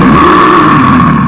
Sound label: Big Monster; psion cd vol1